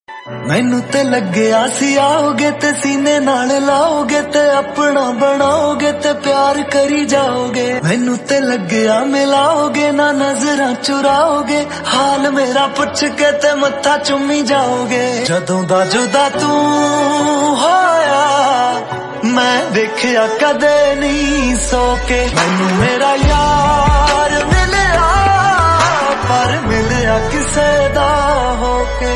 Punjabi Song Ringtone

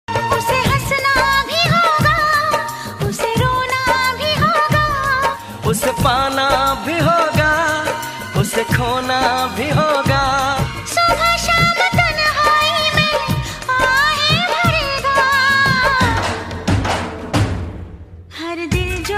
Old Song Ringtone

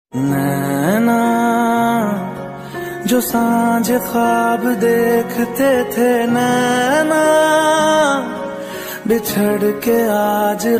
Sad Version Song Ringtone

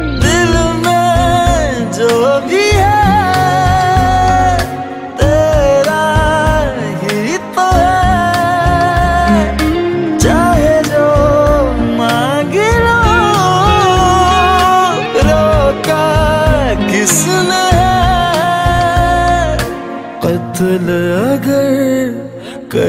Ringtone

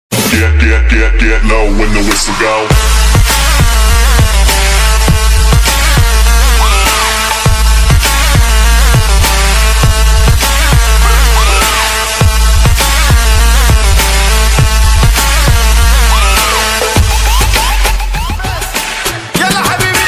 Devil Ringtone For Alarm

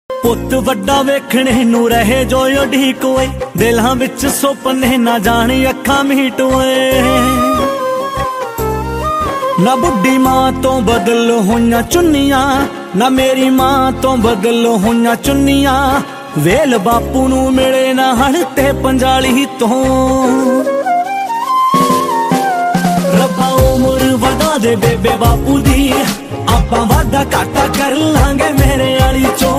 Punjabi Ringtone

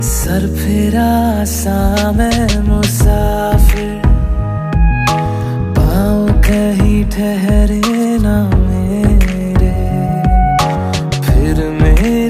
Indian Movie Song Ringtone

